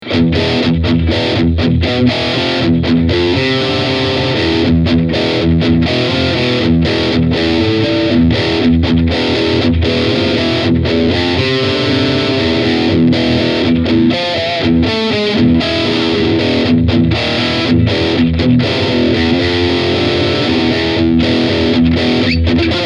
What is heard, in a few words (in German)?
High Gain ISF Rechts